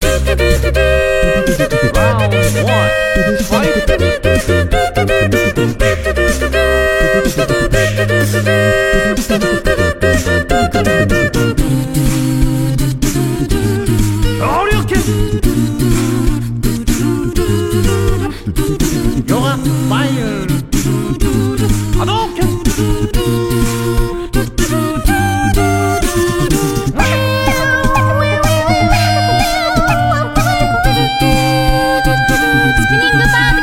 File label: Acapella; Street Fighter 2